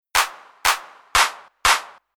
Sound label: Electro Clap, Rhythm